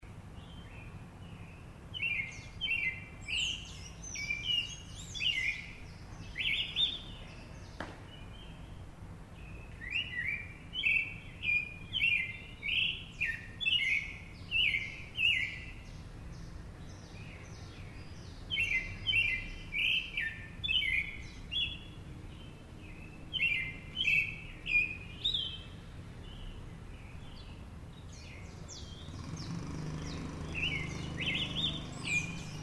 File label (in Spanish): Turdus ignobilis ignobilis Zorzal Piquinegro